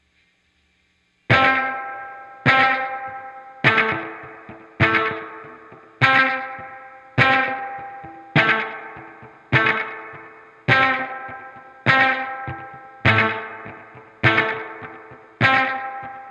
guitar volume swell

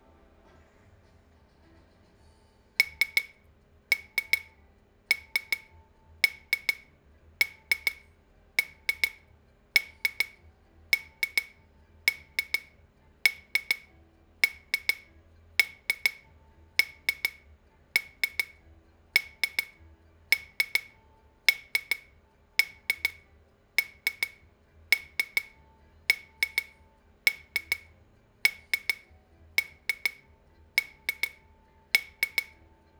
twisting shaker thing

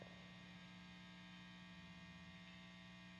guitar overdub; guitar overdub gareth

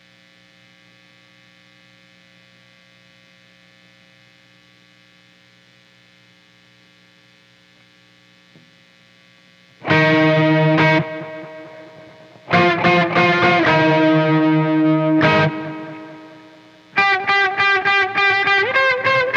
guitar

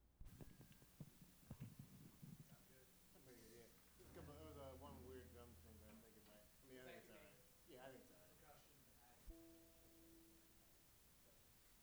SNARE

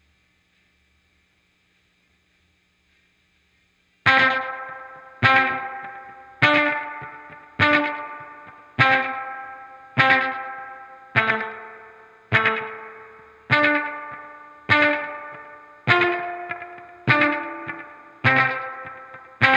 guitar volume swell

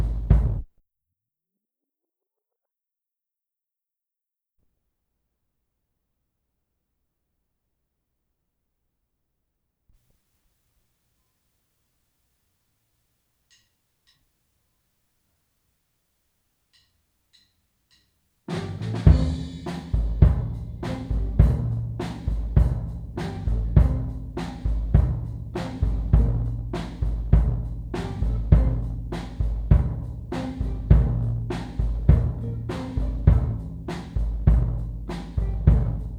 kick

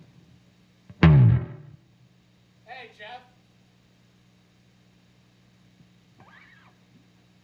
bass slide

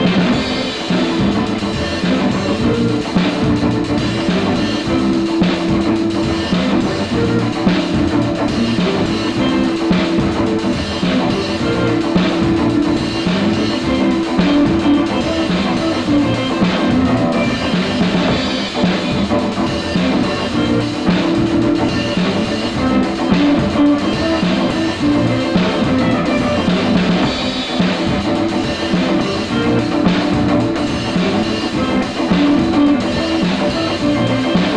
overhead

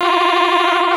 sax two

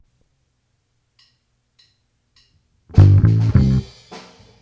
bass

